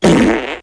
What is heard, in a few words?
camel